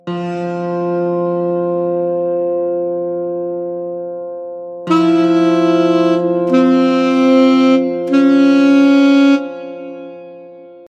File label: E C Db F Drone; trichord ear training; 3 note combination singing exercises